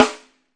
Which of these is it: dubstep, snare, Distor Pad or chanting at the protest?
snare